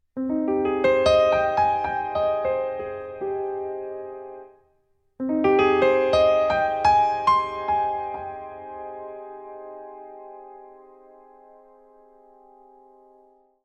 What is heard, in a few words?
Piano dry; Noire